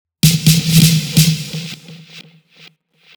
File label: Fill Ins 128BPM; Vengeance Samples; VEH2 Loops